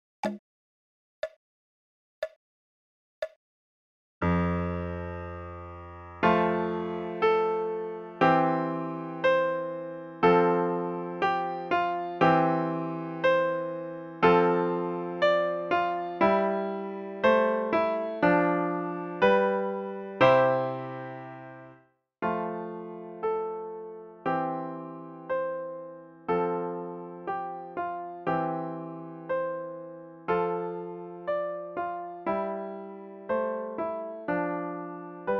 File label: Harmonious Blacksmith Slo; Harmonious Blacksmith, Theme--by G.F. Handel